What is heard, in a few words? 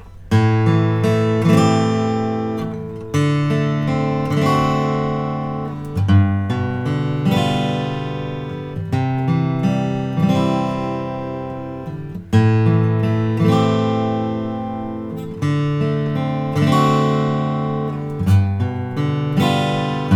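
froggy bottom h 12 deluxe german, guitars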